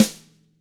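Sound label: DW HIT, drums, snare; ILIO; Double Platinum Drums 1; DW SNR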